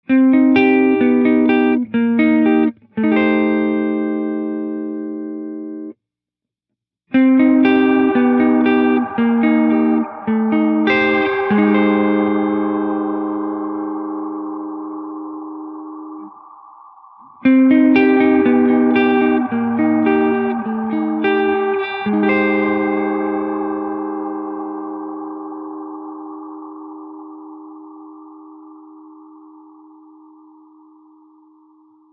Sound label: Ghost Ghost Dark, reverb